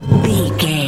manhole metal slide door open close

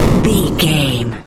retro explosion bass

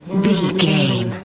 fly buzz flying

